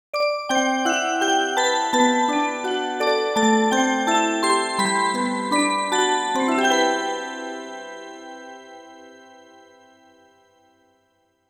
Jingle (orgel); Kissbell; Smile Kiss

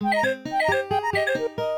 Alien stamp; Leapster L-Max Learning Game System

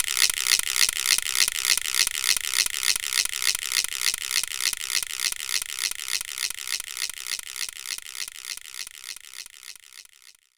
Guiro Long

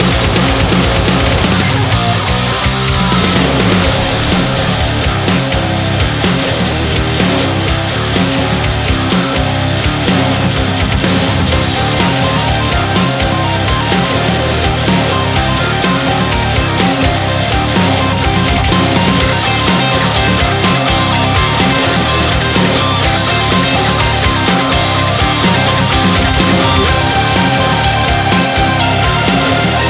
BGM RAID WIN; Busou Shinki Armored Princess Battle Conductor; arcade

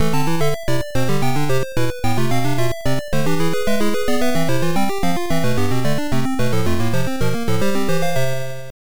Block Clear; Lode Runner III Golden Labyrinth; arcade; Irem M62

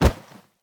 Healing Star activate; we are the dwarves gamerip 2016

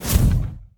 puff smoke

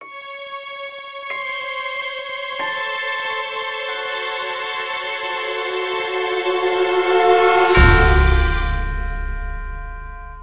Sitar

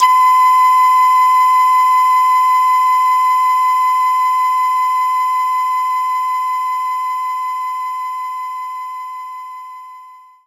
Voice Oohs Eb4

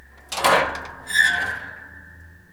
Attic Door Creaking

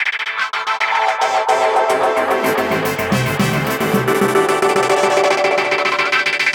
Disco TransitionFX; Plants vs. Zombies Battle for Neighborville

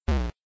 bump

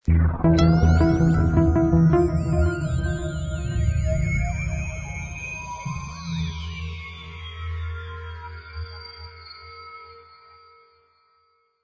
Music Theme; Ballance

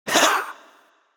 Damage (Voice); children of morta; Scorpion King

